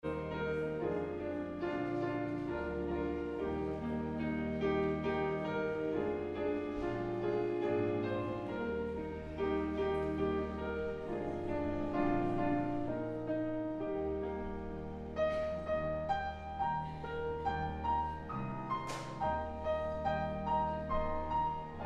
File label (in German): Vorspiel